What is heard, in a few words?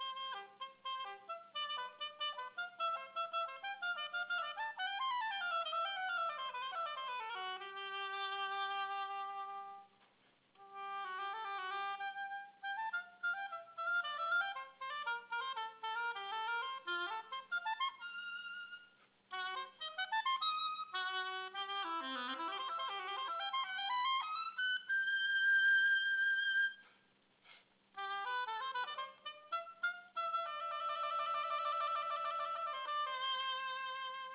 cadenza